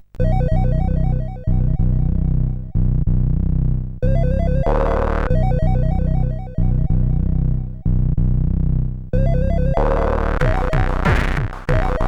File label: sid, CSG8580; Tough to Break